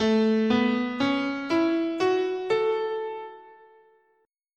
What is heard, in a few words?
A Major Pentatonic